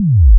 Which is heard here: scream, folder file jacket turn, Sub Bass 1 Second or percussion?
Sub Bass 1 Second